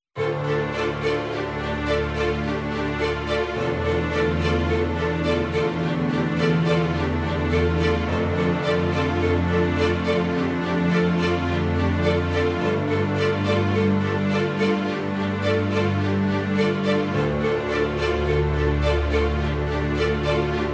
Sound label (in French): SUSPENCE A CORDES